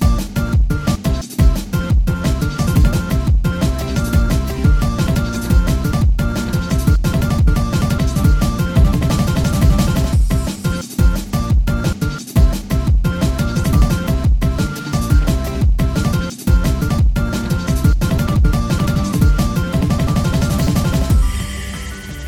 Nokia Ringtone; Nokia 4.2

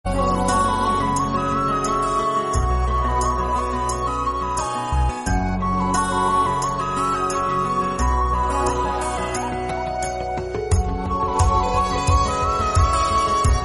Hindi Ringtone

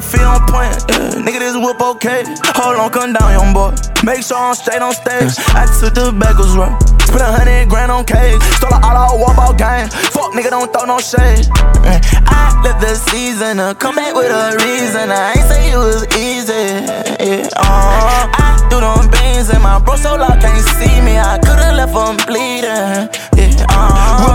English Song Ringtone

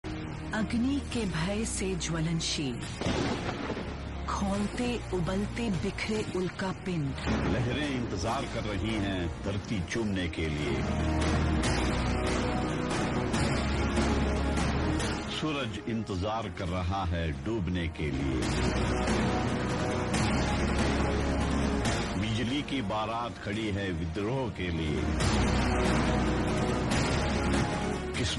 Hindi Ringtone

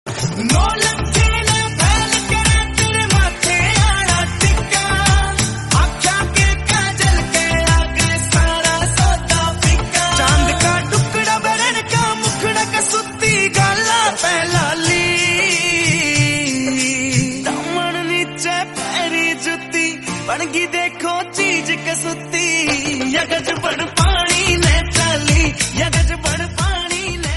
Love hindi

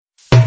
Hindi Sms

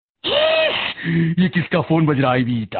Hindi Funny

